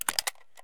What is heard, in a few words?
dmr pickup; Escape from Tarkov